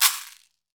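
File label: Latin Shakers, caxixi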